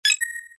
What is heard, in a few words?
arrow click